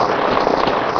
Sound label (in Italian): Scivolata, Quando Lara scivola lungo una pendenza; Il JukeBox di Lara